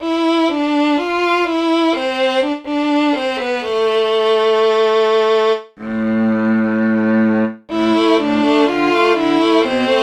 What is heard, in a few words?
fiddle